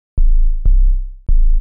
HOUSE BASS 125 A

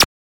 Glitch, One Shots, Glitch FX

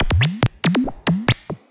ELECTRO EXTRA 140

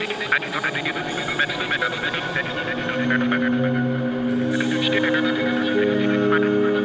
techno vocals 140

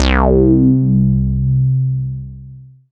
BASS MONO

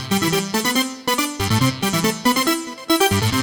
TECHNO SYNTH 140; Keys

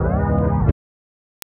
house synth 125 A, keys

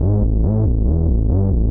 TECHNO BASS 140 A

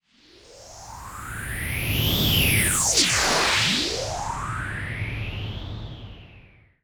TECHNO FX 140

